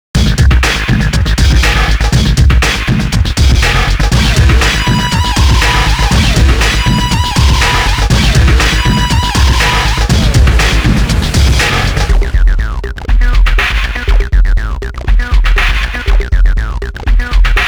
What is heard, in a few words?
Helicopters Trying to Escape (Interlude); Helicopters Trying to Escape (Interlude Type 2)